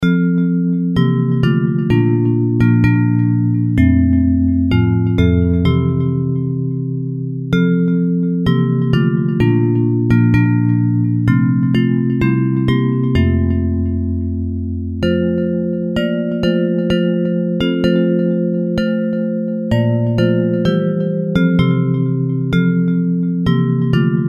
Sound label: Bells